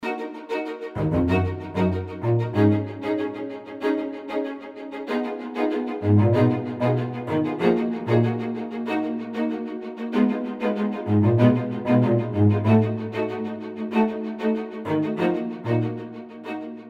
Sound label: New Strings